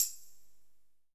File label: hit